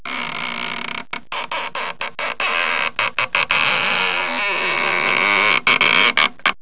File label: Creaking